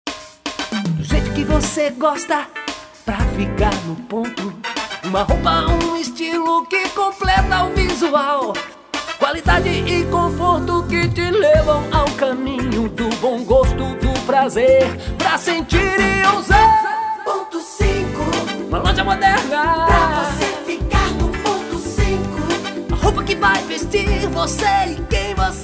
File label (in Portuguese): Jingle; Ponto 5